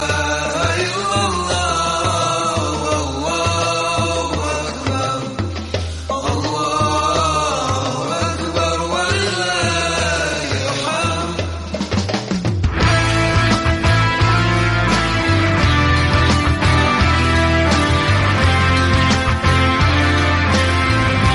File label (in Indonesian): campuran arab nada dering